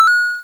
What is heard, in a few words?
Pickup coin; Retrocality